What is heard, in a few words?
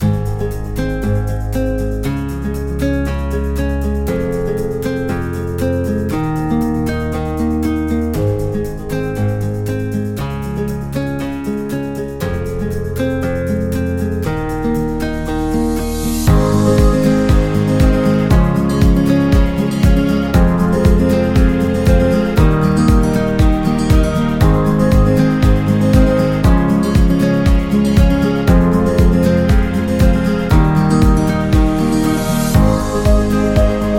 Guitar Acoustic